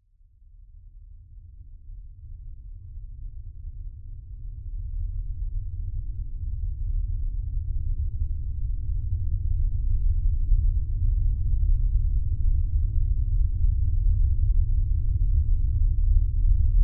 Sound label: science fiction deep space atmosphere hum drone; zapsplat